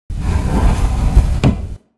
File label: cabinet close